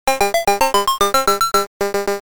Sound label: Run Away Monster; arcade; Dig Dug